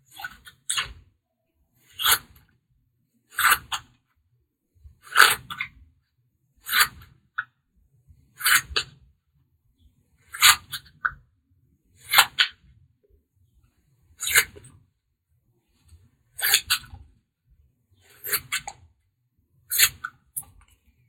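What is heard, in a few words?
scissors cutting paper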